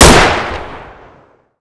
survivor ak47; cstrike; ZP CRISTIAN

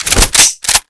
draw, weapons; kriss sv

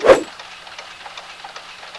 knife slash; spknife

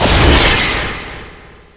acidtrap explosion

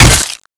water knife hit

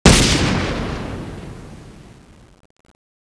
thunder

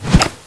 swing, weapons; cstrike

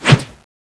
wind knife slash

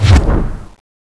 leap jump

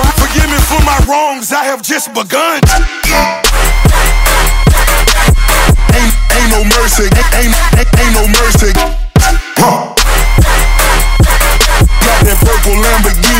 electro; roundsound

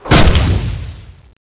razor knife hit

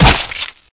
sword hit

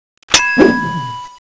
razor knife deploy

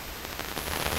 static light; cstrike; D26 slender

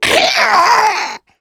spitter death

